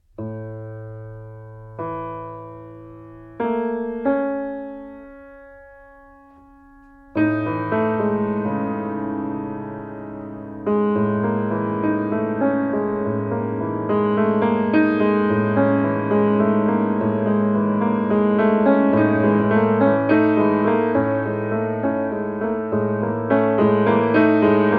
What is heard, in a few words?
piano